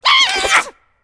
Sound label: Character Pigmies Attack; Angry Birds Epic